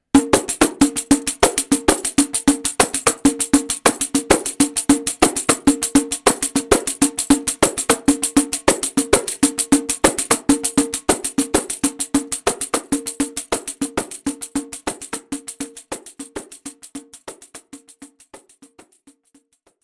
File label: pandeiro